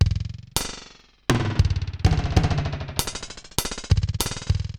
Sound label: Digital Delay RX17 (100BPM), cyborg beats